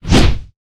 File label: Melee Whoosh; Evolve; Goliath